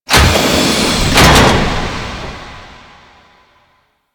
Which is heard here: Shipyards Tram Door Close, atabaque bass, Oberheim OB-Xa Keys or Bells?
Shipyards Tram Door Close